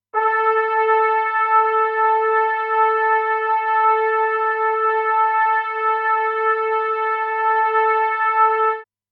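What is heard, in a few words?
Trumpet Soft; プロの音プロの技・令和版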